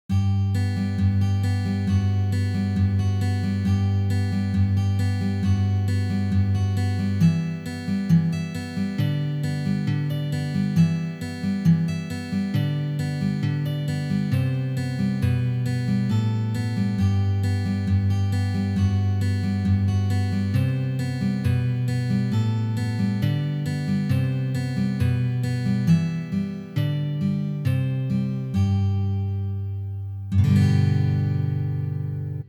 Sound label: Drone Notes